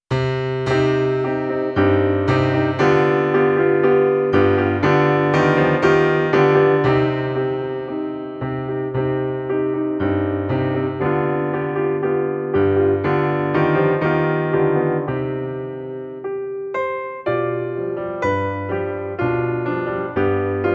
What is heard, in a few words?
solo piano